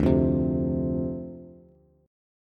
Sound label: D-Minor 7th D Bass, chord; Dm7; strummed